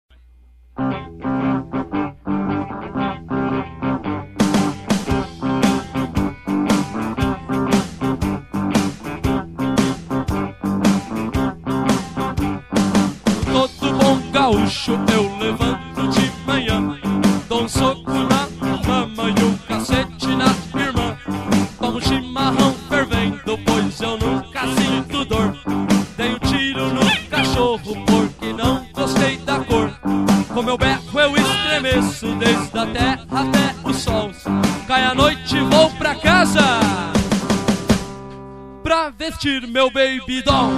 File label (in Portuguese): gaucho